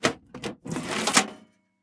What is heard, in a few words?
SFX case Remove Metal; PC Building Simulator